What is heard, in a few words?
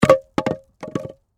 Impact Wood Plank, Drop, On, Rock, Pile; Wood (Sound Effects:Materials); BlastWave